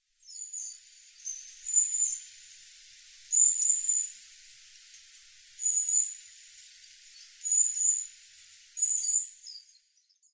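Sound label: Iriomote Tit, iriomote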